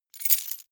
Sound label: key ring large place hard